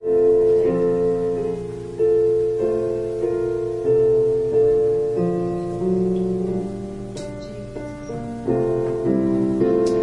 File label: Piano prelude